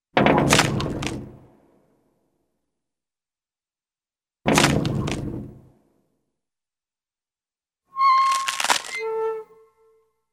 Reverberant Metallic Creak & Crunch, cartoon; Hollywood Edge; Cartoon Trax

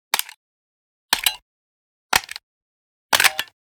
Generic Button Metal Small

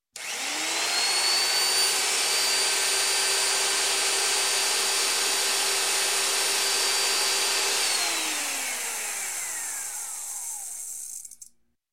Construction, Router Start, Run, Stop; Sound Ideas 2000 Series

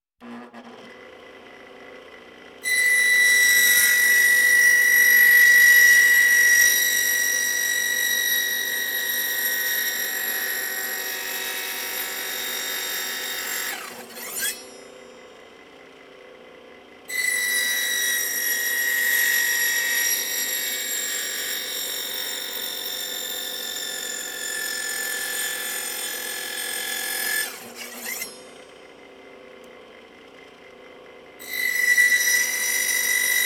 Walking Machinery Trucks

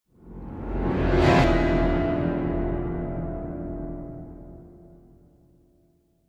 Piano Reversed Danger Chord - Coming, Semitone; Hollywood Edge; sound designer's toolkit v2

